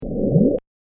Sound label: bloop